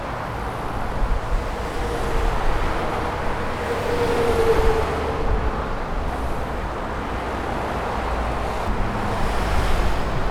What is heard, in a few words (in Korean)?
차속도소리